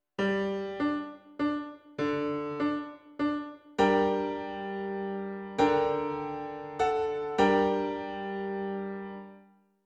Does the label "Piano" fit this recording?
Yes